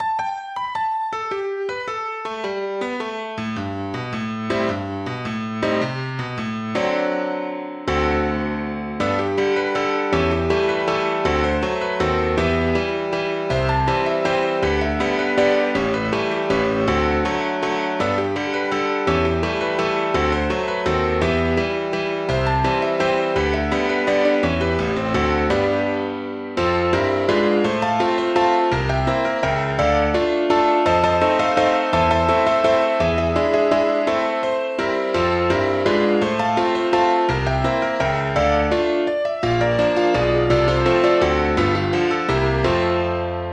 A Concert Waltz

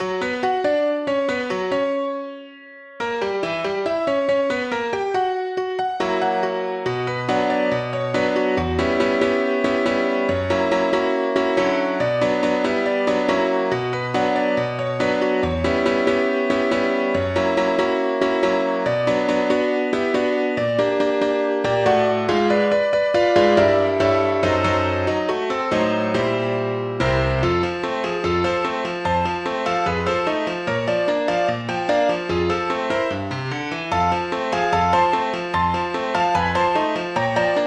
A Real Slow Drag

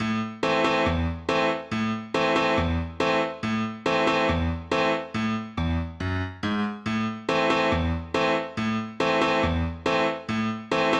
Skeleton Folk Song

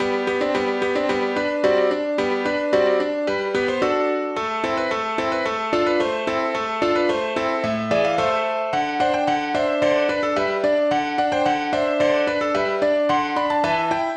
Ballet Music No.2 in G major

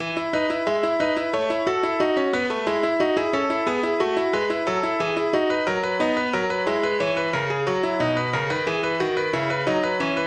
Prelude in E Major BWV 937

